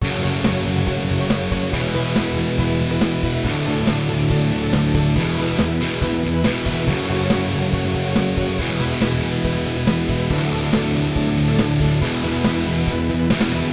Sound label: GR6 HIGain Breather